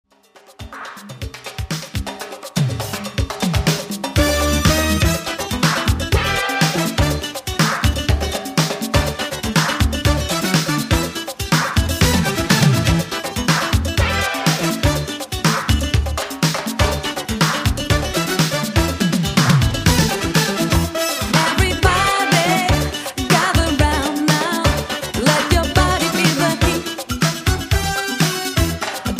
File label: conga